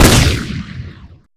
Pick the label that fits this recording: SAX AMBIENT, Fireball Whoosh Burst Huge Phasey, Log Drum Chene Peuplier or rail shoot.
rail shoot